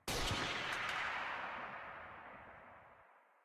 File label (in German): rsound gewehr; minecraft